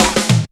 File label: Bacon Roll, Drum Rolls; Jungle